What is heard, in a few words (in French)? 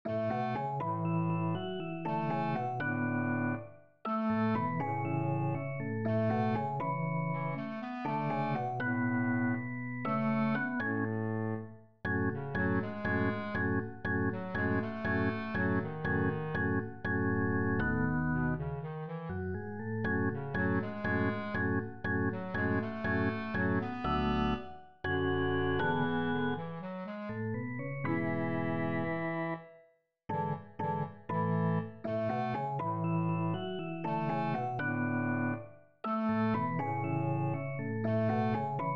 melodie